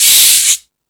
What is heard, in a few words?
Percussion